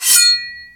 sports room sfx, sword ring; gamerip; birthday party bash; wii

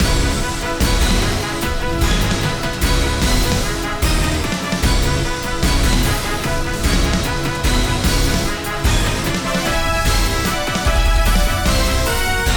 Hard Drag; front mission 1

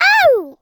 Classic Hit Out Sound; Jolly Battle

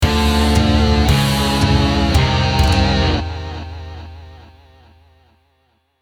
worm fail

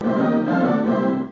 Win Boss Theme; Spider-Man; Plug & Play

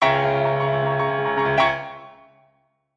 Dramatic Chord; gamerip; Baron: Fur Is Gonna Fly; switch windows xbox one

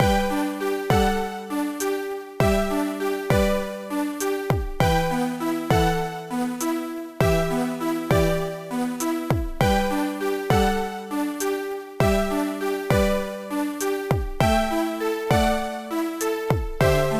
BGM SLOT; Jewelpet Kawaii Mahou no Fantasy

